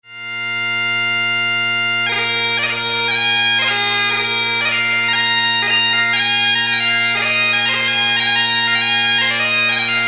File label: Bagpiper 118bpm